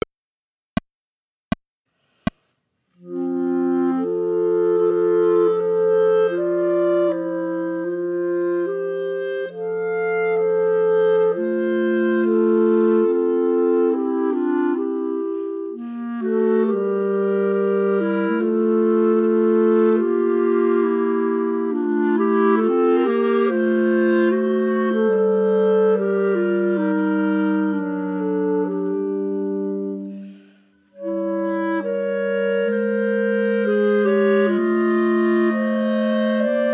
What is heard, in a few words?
Prayer Ensemble minus 3rd part; Humperdinck